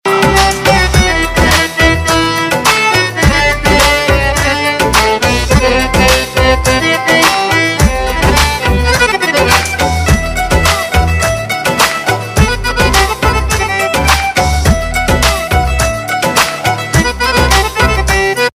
instrumental ringtone

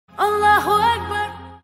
islamic sms tone ringtone

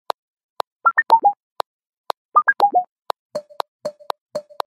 beats ringtone

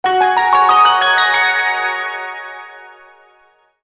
chinese message sms tone ringtone

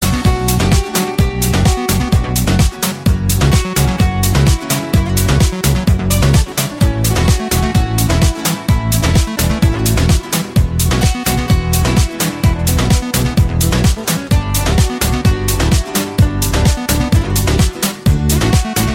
cool latino mix ringtone

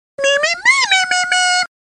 text tone ringtone; beaker

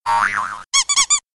boing squeak ringtone

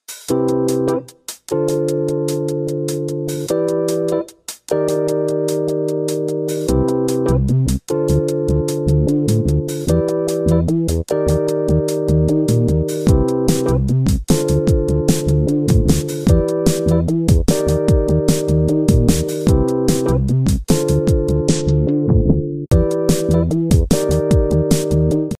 jazz ringtone

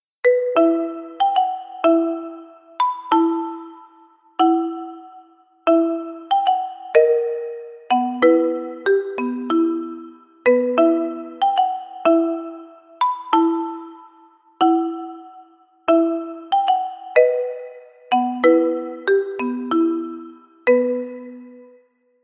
marimba remix ringtone; harry potter

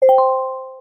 notification sound ringtone

